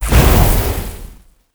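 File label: boss explosion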